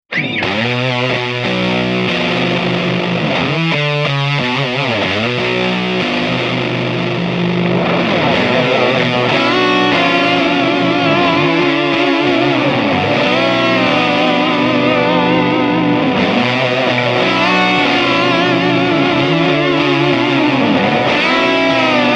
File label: superfuzz